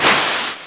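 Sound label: water out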